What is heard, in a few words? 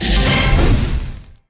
expand wild; Fire Queen